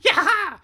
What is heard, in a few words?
rage